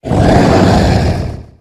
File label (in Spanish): Grito de Barraskewda